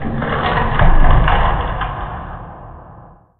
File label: strange noise, underground; ambient